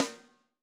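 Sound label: Hi Piccolo Room; Alesis SR16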